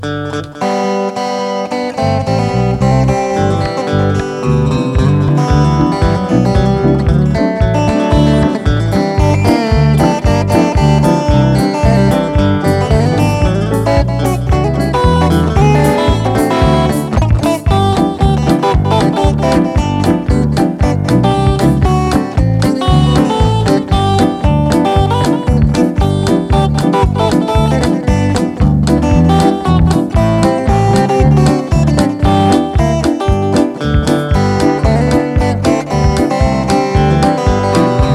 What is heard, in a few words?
Guitar; Singing; at calvary